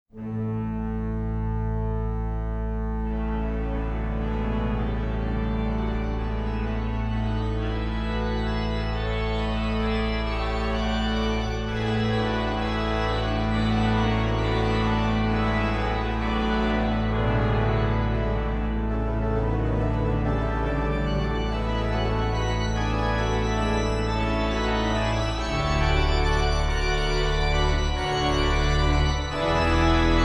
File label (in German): weihnachtliche orgelmusik